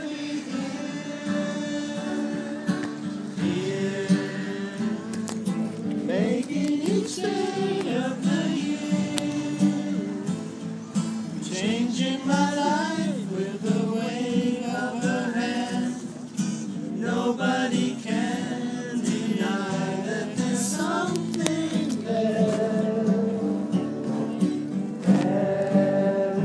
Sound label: singing songs